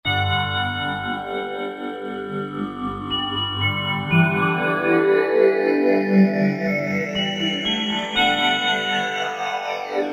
Roland V-Synth; Factory Patches

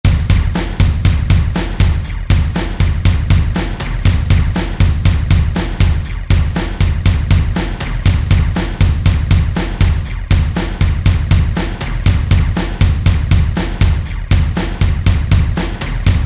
Thrash

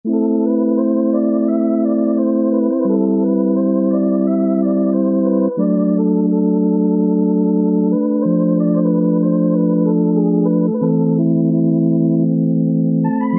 Pad Organ, Organ Flutes; Yamaha PSR-3000; Factory Voices

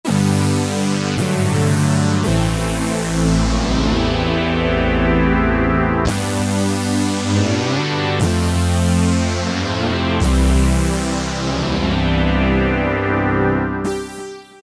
Synth, Korg MS2000; Factory Patches

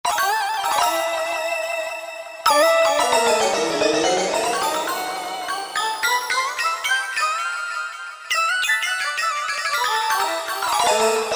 Crazy Lead

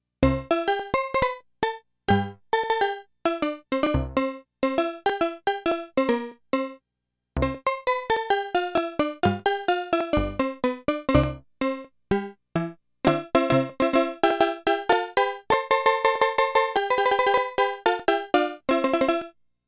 Steel Drums

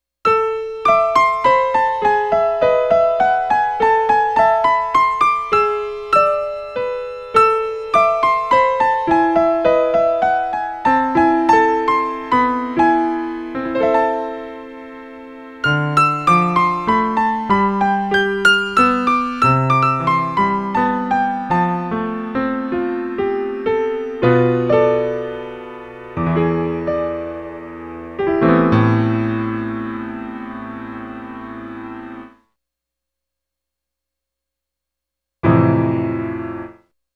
Ac Piano Hpf

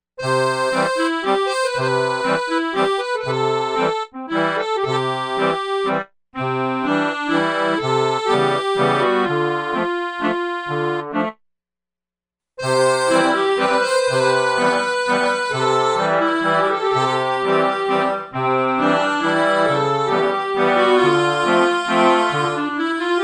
SMALL BRIGHT HALL

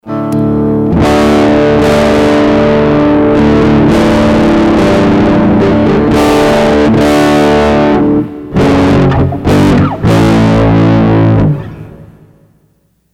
spin tone; Sony HR-GP5

